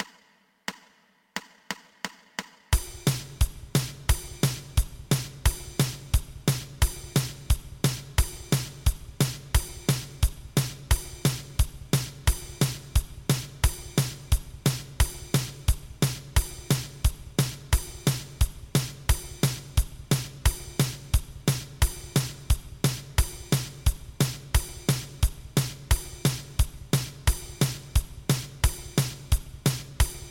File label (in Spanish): 2 pulsos